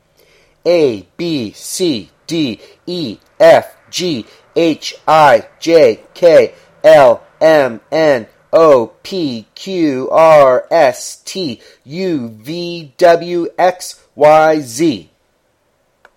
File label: alphabet faster